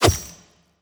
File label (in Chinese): 轻攻击